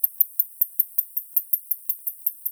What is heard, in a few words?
Vespertilio murinus; europe